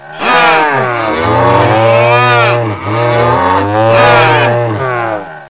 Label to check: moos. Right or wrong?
Right